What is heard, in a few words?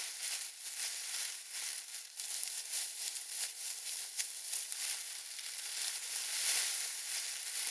foliage slide loop